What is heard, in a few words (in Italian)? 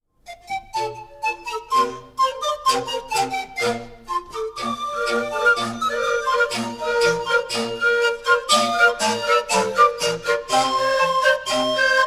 flauti di pan; brianza